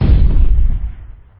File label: explode; openspace3d; aliencrash